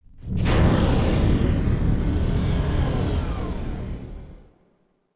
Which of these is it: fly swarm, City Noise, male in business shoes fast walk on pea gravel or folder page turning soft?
City Noise